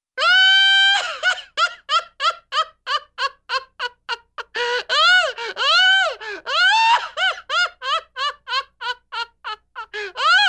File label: Funny Man Laughs Hysterically, Cartoon; Hollywood Edge; Cartoon Trax